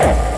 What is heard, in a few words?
Hard Kick